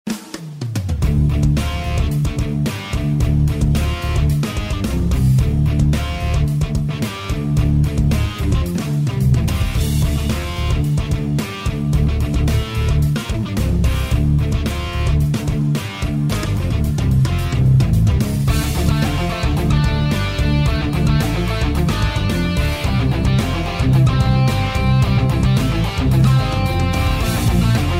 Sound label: music forest; iDaggers; Android